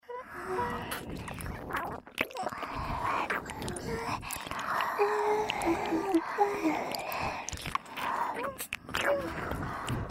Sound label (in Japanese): フェラ＋両耳舐めループ（通常ver）